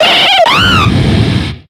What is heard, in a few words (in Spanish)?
Grito de Infernape